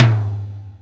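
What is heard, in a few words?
Md Clr Wet, Alesis D4 drum machine tom; 300 Drum Machines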